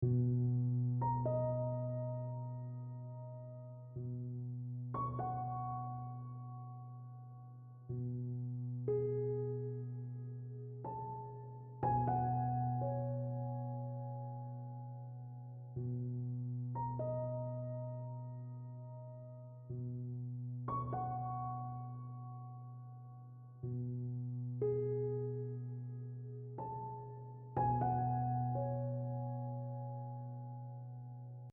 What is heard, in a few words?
piano